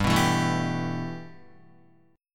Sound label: G 6th Add 9th G Guitar, chord; G6add9 strummed; 2,2,4,2,4,3